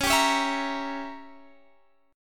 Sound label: C sharp Diminished 7th, C sharp, chord; strummed; C#dim7